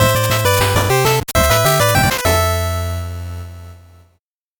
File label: 8bit jingle fast